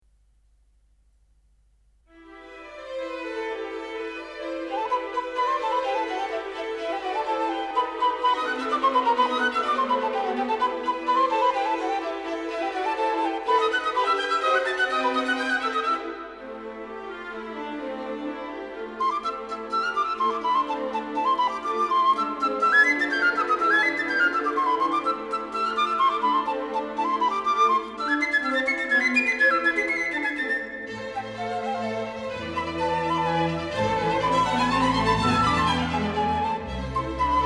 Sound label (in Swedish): dansande flöjt; Dancing Flute, piccolo